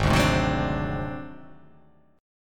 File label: G sharp 7th Flat 5th G sharp down, chord